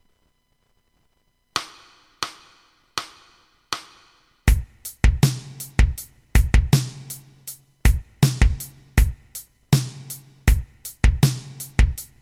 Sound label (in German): rock etuede 80